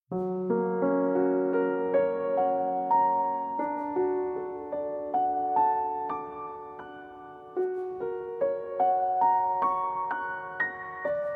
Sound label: Ethereal (Piano & Cello)